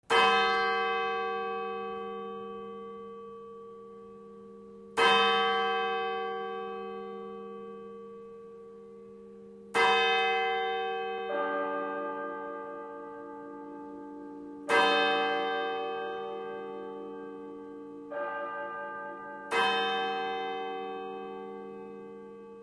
Two Clock Tower Chime

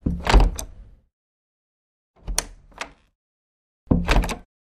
Door Wood Open, Close x3

